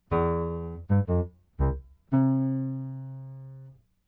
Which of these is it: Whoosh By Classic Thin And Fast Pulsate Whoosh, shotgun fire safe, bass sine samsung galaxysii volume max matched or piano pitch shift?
piano pitch shift